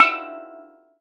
Steel Drum E5

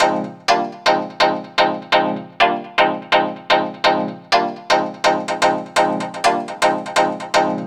SYN 125, House synth loops

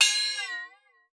WATER, PERC; Xsample VOL-9

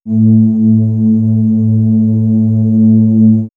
Swirls Ambient Drone, pads; X-Static Goldmine

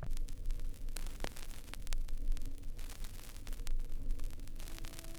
Vinyl, noise

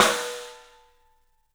STEEL S, drums; 3D Sound

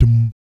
BS DMM, Vocal Planet Jazz & FX; ILIO; BASS DUMMS

